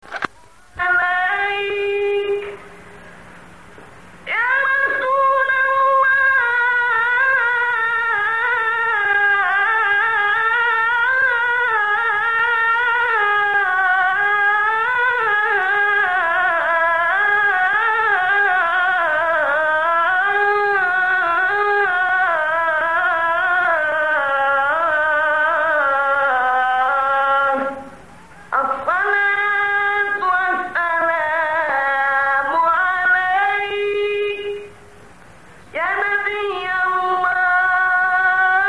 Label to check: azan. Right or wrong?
Right